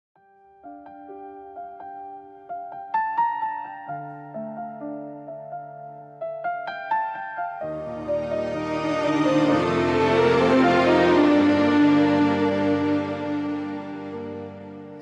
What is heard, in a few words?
ringtone instrumental